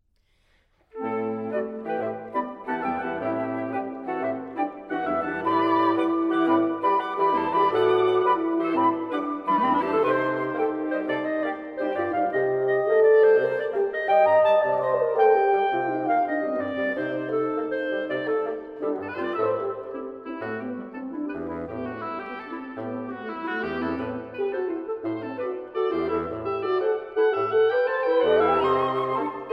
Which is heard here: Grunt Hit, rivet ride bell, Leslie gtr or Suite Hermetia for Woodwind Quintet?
Suite Hermetia for Woodwind Quintet